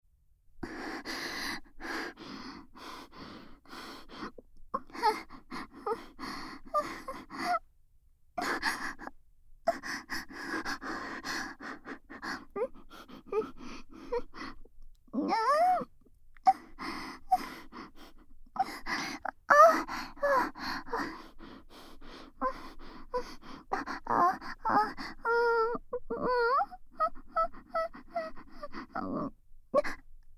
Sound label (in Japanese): 好き好き連呼ベロチューえっちループトラック, ASMR; エロ舌JKアスカちゃん; 学園耳舐め裏リフレ